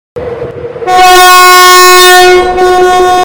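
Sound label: train horn very loud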